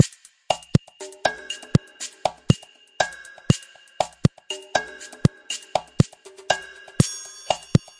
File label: halfway offbeat ambient rhythm, drum loops and breaks, 120 bpm